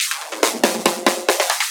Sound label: Loops Fills; Vengeance Samples